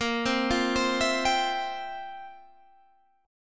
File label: F Major 7th Suspended 2nd Suspended 4th, A sharp, guitar